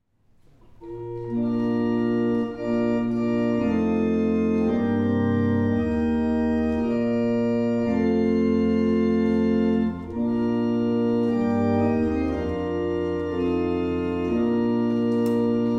barrel organ; Lopham

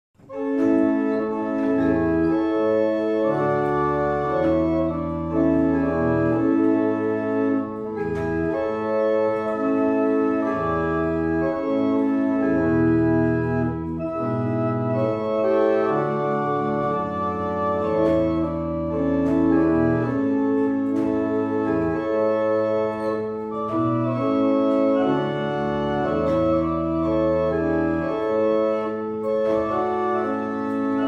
barrel organs; Brightwell

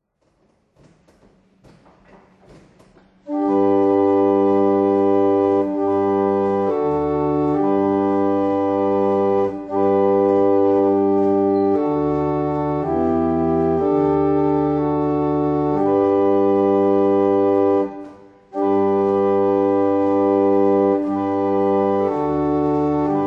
Single Chant, barrel organs; Letheringsett

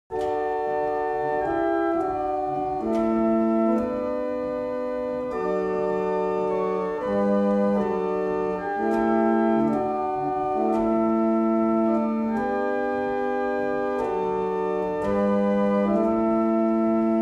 Double chant, barrel organs; Wardley; Mornington

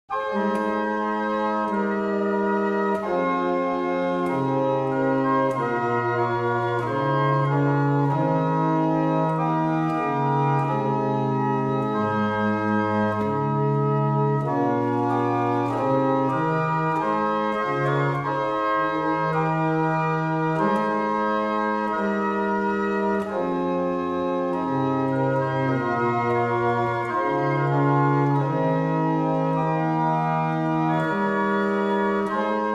barrel organs; Tytherley; Esher

